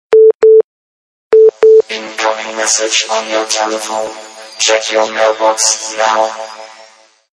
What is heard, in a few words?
robotic mail